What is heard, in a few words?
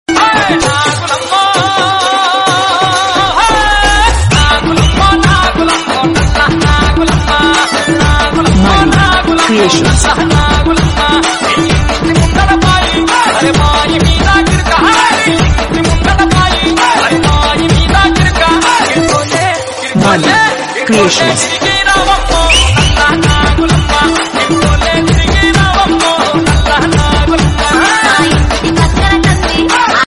folk telugu song